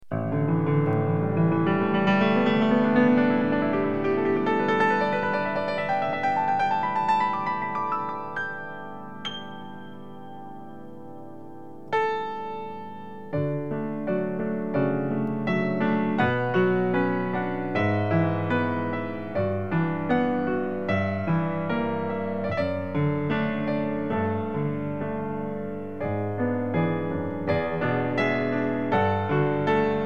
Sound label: piano